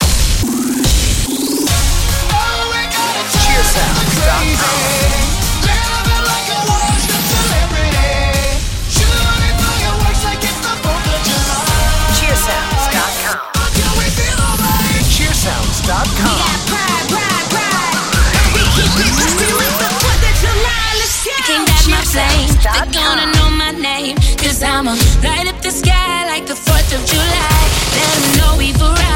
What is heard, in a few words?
firework theme; sparklers